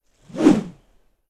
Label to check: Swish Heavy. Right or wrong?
Right